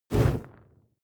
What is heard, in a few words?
oil lamp medium inflame